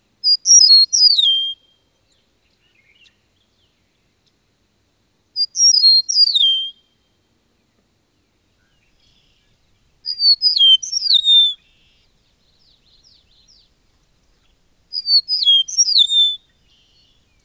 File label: meadowlark; Bird Calls; Ornithology